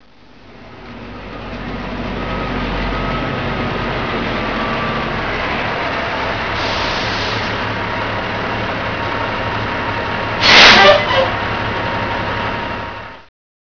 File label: Truck